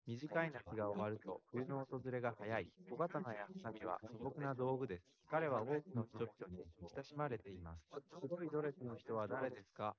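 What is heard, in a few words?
male, instantaneous mixture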